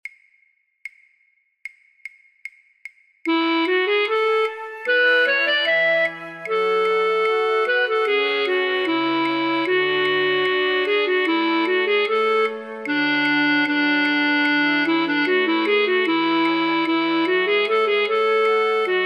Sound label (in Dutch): Ritournelle flute choir voor oefentracks fluit; Rameau; Platee